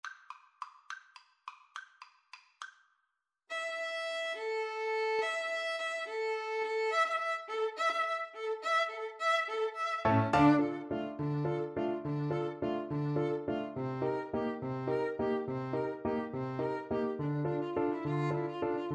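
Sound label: violin piano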